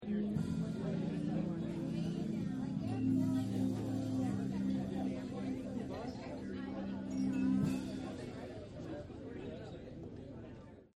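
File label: Nightclub Music Ambience End; Bars and Clubs; Sound Effects:Backgrounds; Nightclub Music Amb2End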